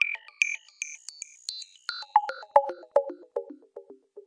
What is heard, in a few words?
echo bops